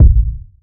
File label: dope kick drum sample a sharp key, kick single hit tuned to the A# note; kick drum samples